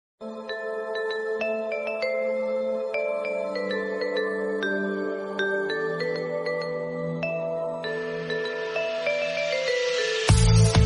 instrumental song tone ringtone, bollywood ringtones